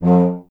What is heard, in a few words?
tuba; Miroslav Vitous - Solo Instruments VOL-2